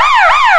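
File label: yelp, siren; vcmod; els; e-q2b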